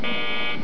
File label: wrong; puertorico